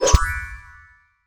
Magic Whoosh Sparkle; transition

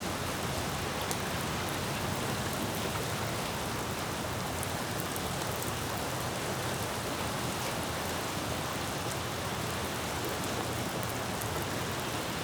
Rain, Street, City, Splatty

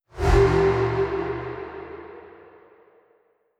Slowdowning Whoosh; transition

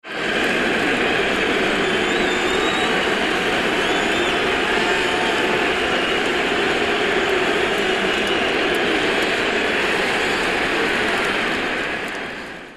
Stadium Crowd Applause; people

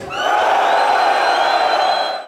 Male crowd scream; people; applause

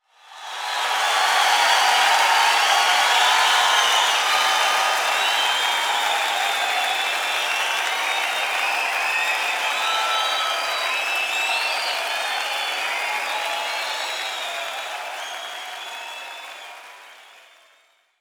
Crowd cheer long; people; applause

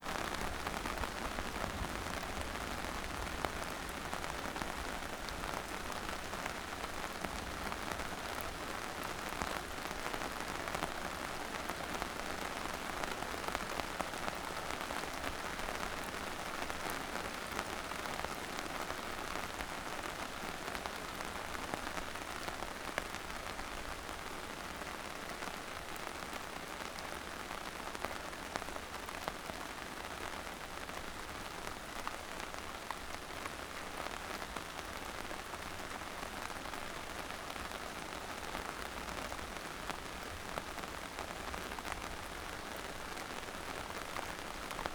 rain on canvas

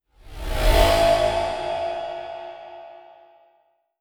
Slowdowning Whoosh; transition

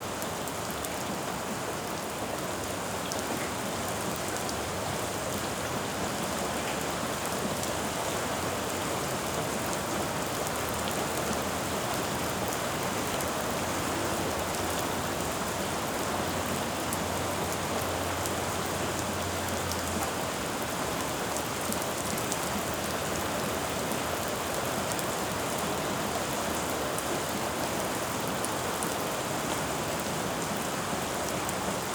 Rain, Street, City, Splatty

